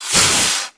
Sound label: Emperor Cobra Attack